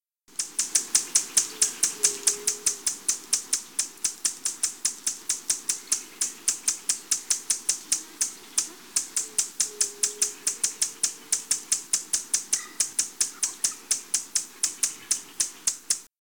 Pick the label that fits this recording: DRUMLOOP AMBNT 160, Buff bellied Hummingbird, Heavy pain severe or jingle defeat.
Buff bellied Hummingbird